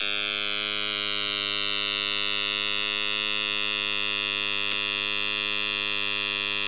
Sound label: bee